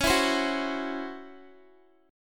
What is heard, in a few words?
Db Minor Major 7th Double Flat 5th Db down Guitar; DbmM7bb5; strummed; JGuitar